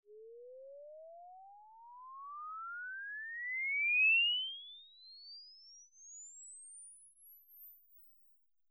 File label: Test sweep